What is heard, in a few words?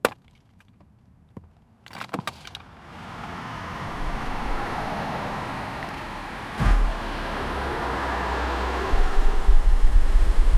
a car driving crashing in